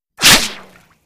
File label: Bullets Slice Water